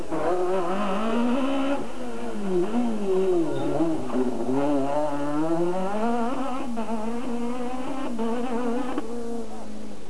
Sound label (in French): megane epingle, Megane maxi dans une epingle